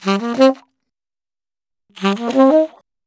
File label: Sax 120bpm Ab, Saxaphone; Quazibeat; African Secret